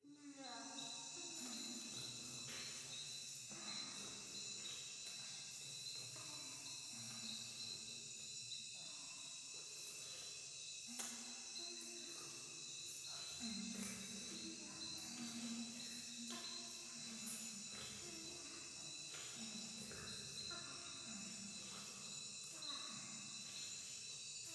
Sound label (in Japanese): 風呂 フェラチオ 山奥 夜